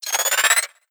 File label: manhack charge power; npc; Half Life Alyx